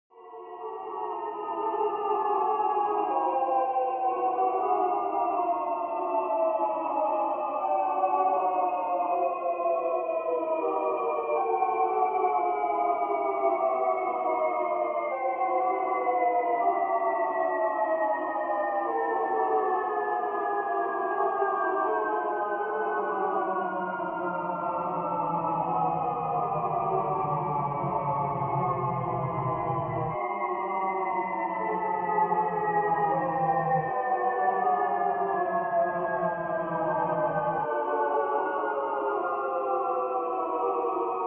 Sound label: sound of space-filling curves; haverkort